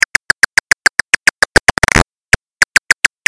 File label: Big brown bat with feeding buzz